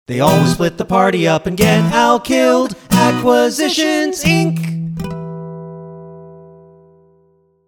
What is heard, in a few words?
jingle; Acquisitions Incorporated